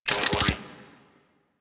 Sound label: Spear Gun Charge Up, Weapons; Warframe; Corpus